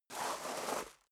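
step dress boots gravel medium slide long, footsteps; schritte; menschen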